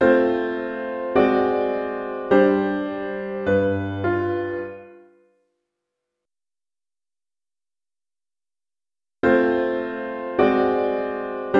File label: harmonic tension and endings